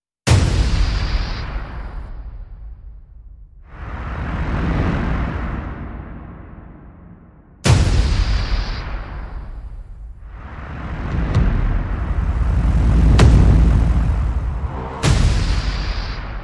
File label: Perc And FX Only